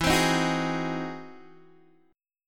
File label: E Augmented Major 9th E down Guitar DADGAD